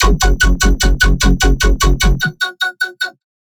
Melodic Bass F# 150, One-Shots Bass Hits; Celestial; Ghosthack; Color Bass Essentials

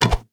Attack Bow; Game Essentials

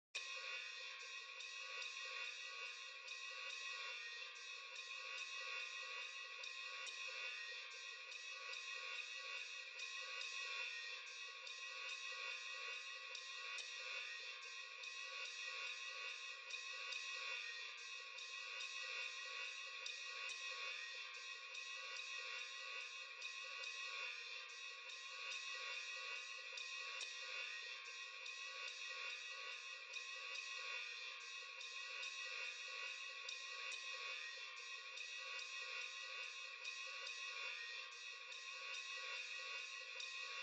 143 BPM A# Min Ride, Hip Hop; Melody Loops; Loop Stems; Cymatics; Americano

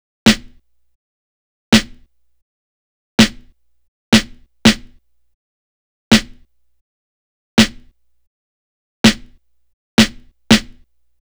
Drum Loop - 164 BPM Snare, Boom Bap; Cymatics; GHOST (Beta Pack); Darling

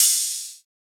Riddim Ride; One Shots; Hats & Cymbals; Ghosthack; Riddim Essentials 2020

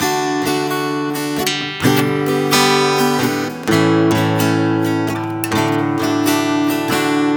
Guitar 130 BPM (D Major), Acoustic Guitar; Follow Me

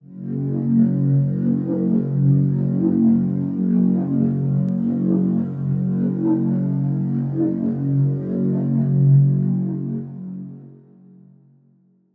Riddim Melodic Hit - F#; One-Shots; Oblivion; Ghosthack; Riddim Essentials Volume 2

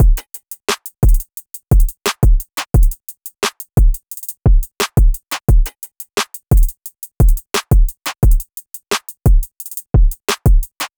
Drum Loop 175 BPM; Jumpshot; Cymatics; DOPE DRUMS VOL. 1

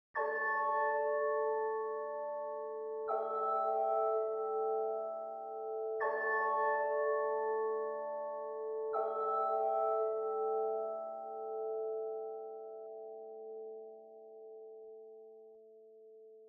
164 BPM B Min Keys; Loop Stems; Cymatics; Rose

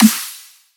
Dubstep Snare; Ghosthack; Dubstep Sound Arsenal

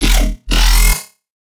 Bass Shot E Pterodactyl; Trap and Dubstep; Bass One Shots; Ghosthack; Free Trap and Dubstep Samples 2018

